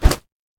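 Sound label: Power Small; smite; god bakasura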